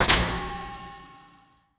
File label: generate a high tech boun, impact